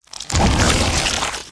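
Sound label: worm boss block; chervj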